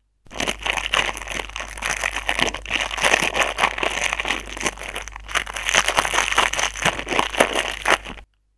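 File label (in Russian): звук шелеста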